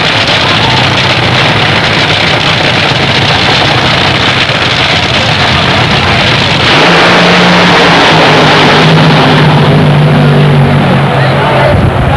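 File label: top fuel, drag racing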